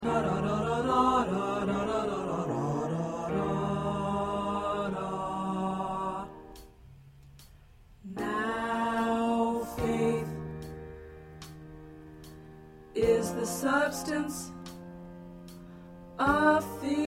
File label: Tenor, choir parts